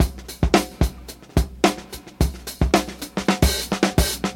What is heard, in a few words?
110 bpm breakbeat c key, kick tuned to the C note